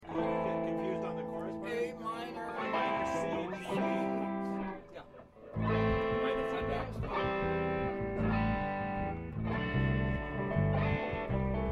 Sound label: Room Mic